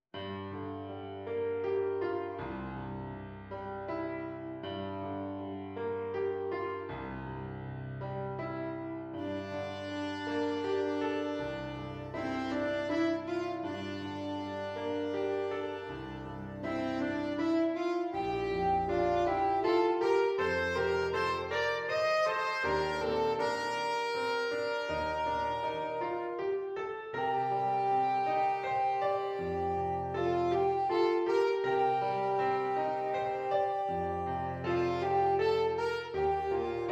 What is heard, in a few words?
SAX; alto tenor or baritone saxophone